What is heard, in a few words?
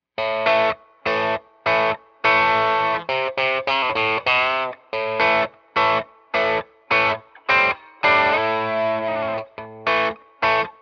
dirty, Tele